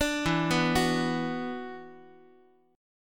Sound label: E 7th Suspended 2nd, down, Guitar; E7sus2; arpeggiated